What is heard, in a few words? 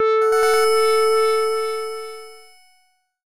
Twinke Notification